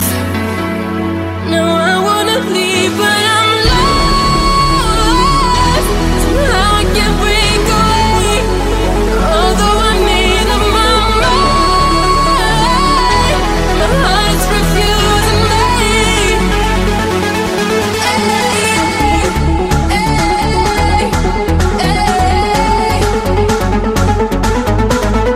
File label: trance music ringtone